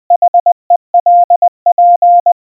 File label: HELP, .... . .-.. .--., Морзе; Запрос помощи; Генератор азбуки Морзе